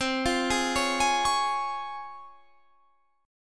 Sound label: Db Major C; Db/C; arpeggiated